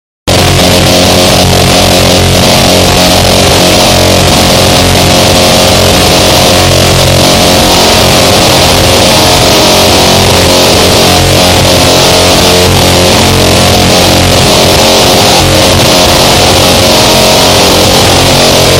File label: Morning Noise Broadcast